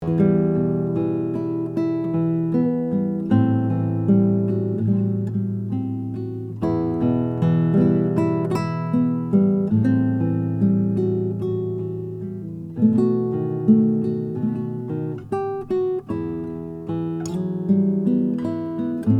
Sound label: radicic birdseye maple french polish